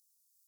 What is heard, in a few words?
Pipistrellus pipistrellus; europe